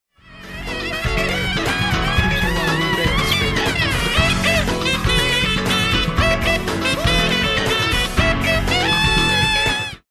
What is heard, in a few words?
round ten sec count